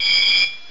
Metal Burst; pokerogue; battle anims

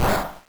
hit weak

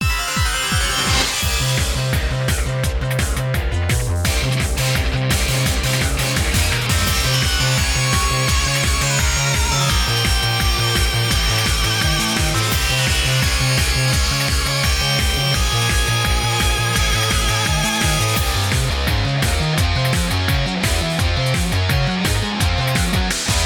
battle; pokerogue; bgm; flare grunt

